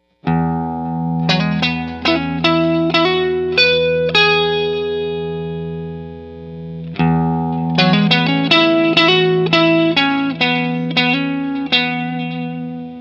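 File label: tele neck clean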